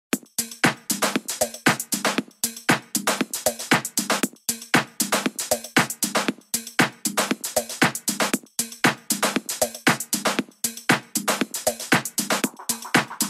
deep remix

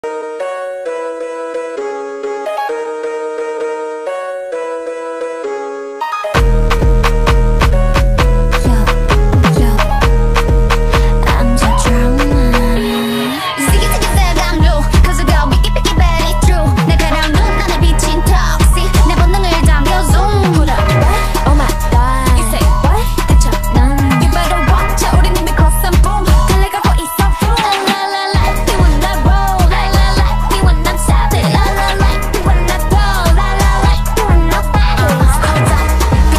instrumental remix

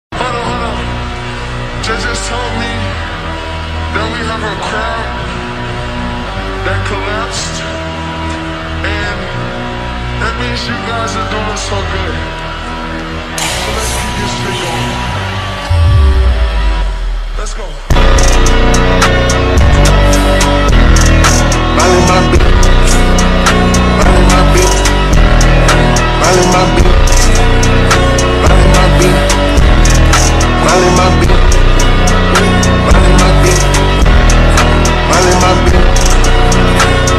guitar cover slowed

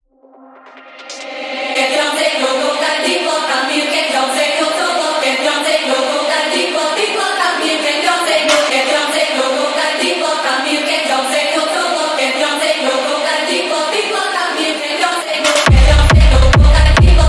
techno bootleg

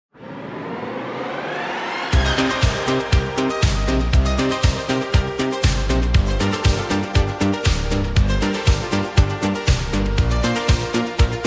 italo disco

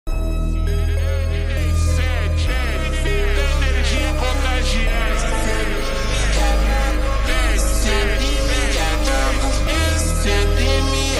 funk remix slowed reverb

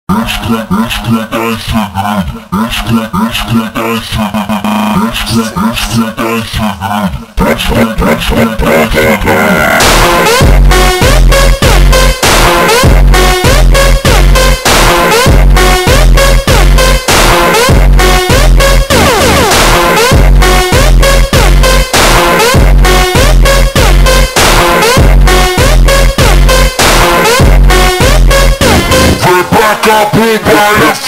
ultraslowed brazilian phonk; Tik Tok Viral 2024

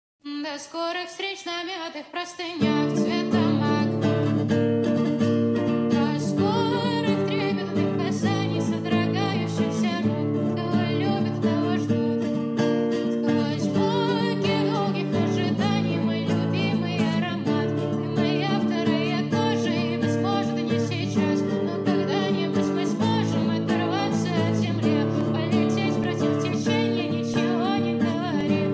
bass version

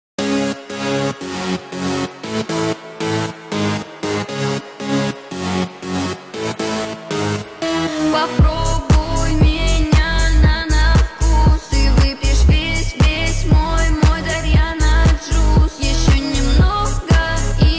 slowed reverb

